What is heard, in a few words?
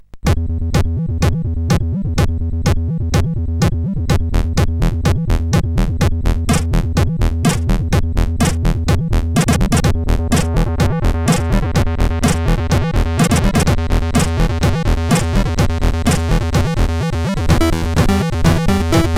Acid at Night, sid CSG8580